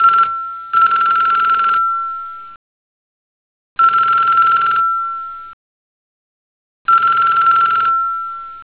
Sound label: phone